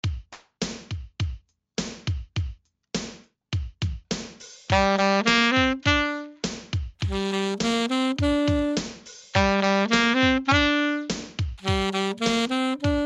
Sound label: alto